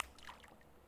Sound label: Splash